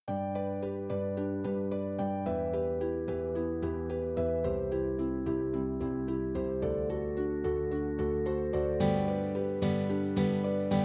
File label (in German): Lied G Dur